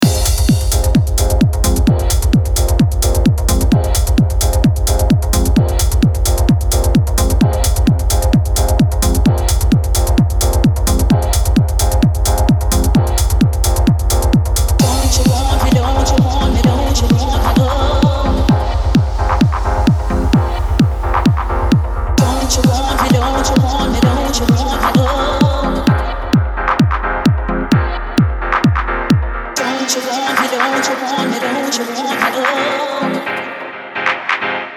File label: Techno; How to make Techno with Captain Plugins